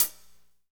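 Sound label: hi-hat, Pop Country, Dry; Northstar; Drumscapes Roland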